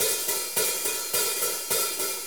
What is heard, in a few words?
4-4 HiHat Loop